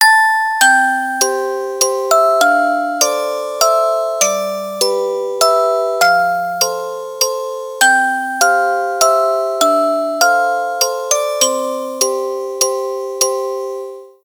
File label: Old bell, ringtones; SK telesys; SK-S100